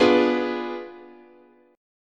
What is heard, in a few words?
B Diminished 7th, chord, guitar